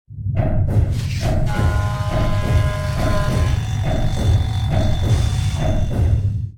repair, battlesuit; Cosmic Rage; general combat

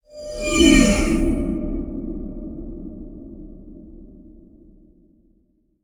warp core open; ships misc

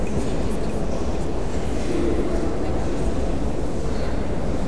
cathedral, ambiances; CosmicRage; CosmicRageSounds

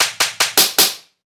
slap; CosmicRage

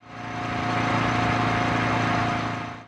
overtake, highway, oldcar; CosmicRage

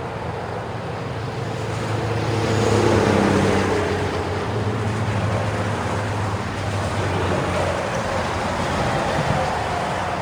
road, ambiances

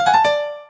boop; CosmicRage

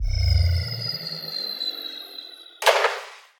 land

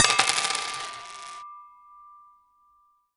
bid, auction; CosmicRage

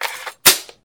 reload, combat

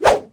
hand swing, combat

